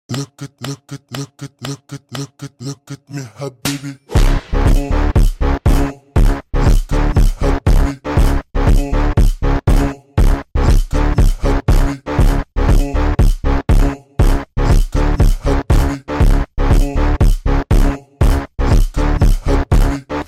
phonk

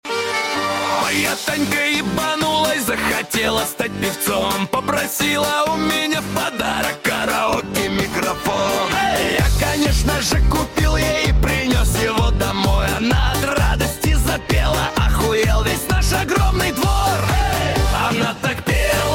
karaoke

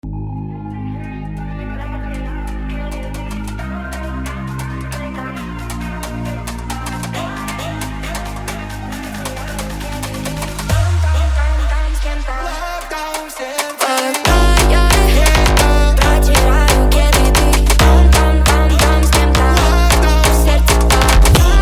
brazilian funk remix

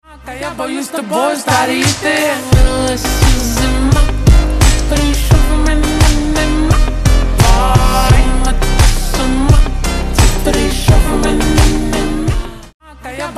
slowed reverb

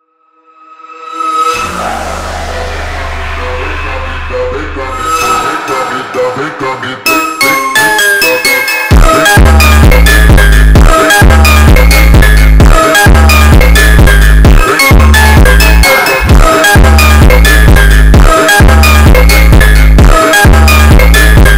montagem phonk

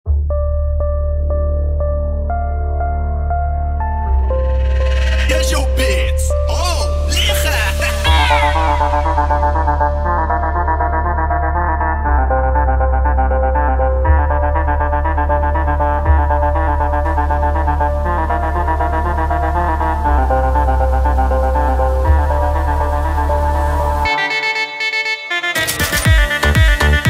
beats ragga

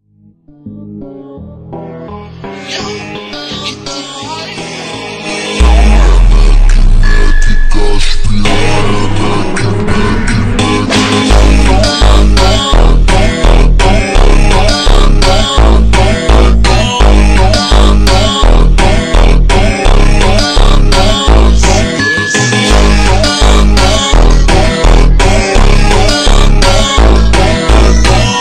montagem slowed reverb

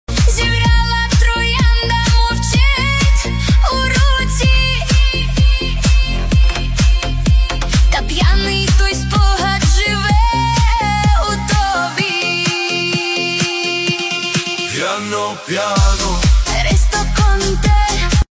piano

